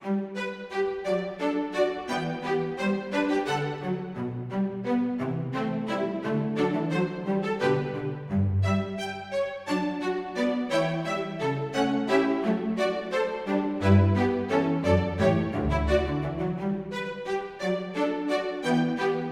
goldberg variations variation 4 arranged for strings; bach